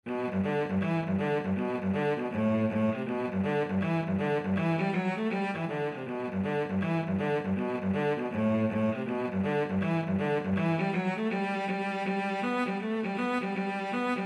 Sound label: leather buttons, cello